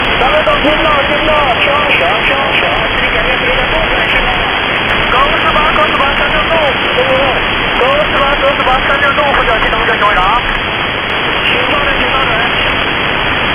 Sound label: voice & mil-std110A; Voice and MIL-STD-110A-AppB; M89